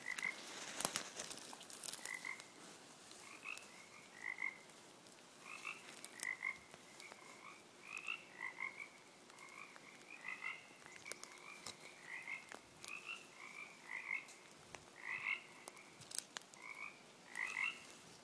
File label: Frogs; birds